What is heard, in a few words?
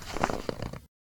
snow, footsteps